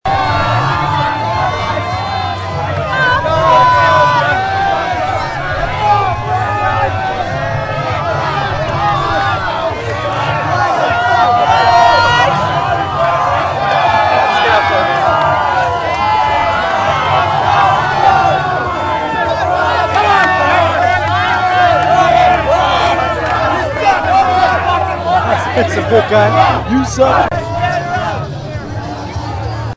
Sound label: Pit Cheering